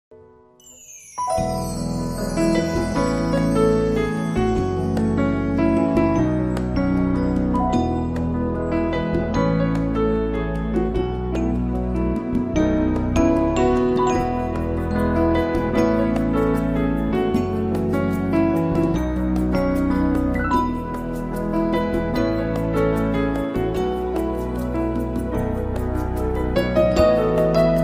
new age relaxing music

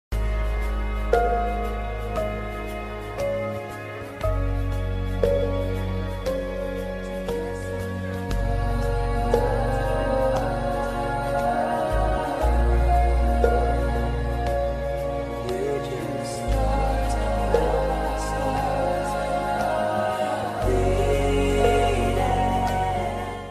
soft ringtone sound

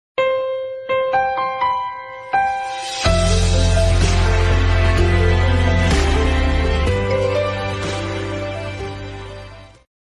samsung s21 ultra ringtone

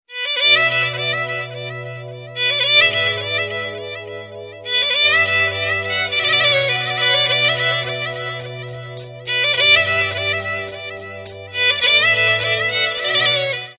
good intro ringtone; samsung ringtones

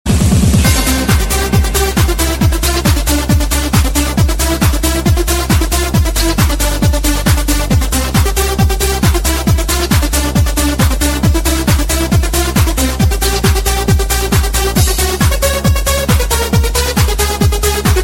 techno mix ringtone; top gun

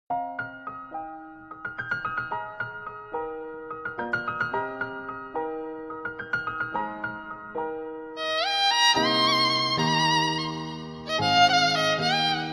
sad ringtone, bollywood

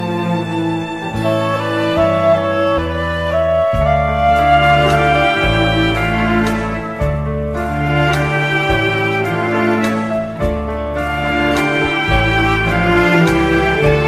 sweet bollywood ringtone

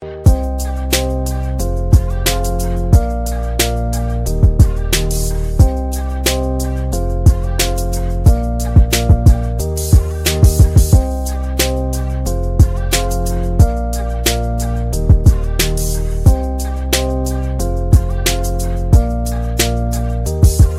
scintillate iphone ringtone

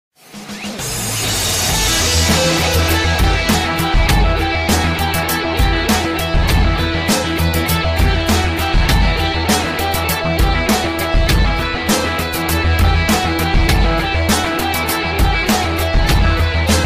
rock ringtone

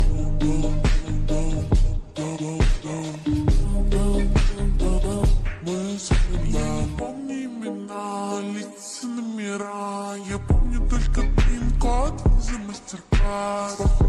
music slowed reverb ringtone

